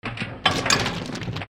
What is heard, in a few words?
machine scream